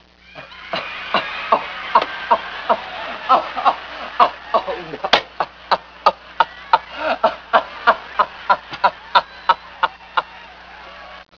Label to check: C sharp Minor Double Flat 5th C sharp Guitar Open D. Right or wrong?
Wrong